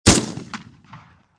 Shotgun 12 Gauge Saiga 12 Combat Shotgun Single Shot Close Perspective; Shotgun Sound Effects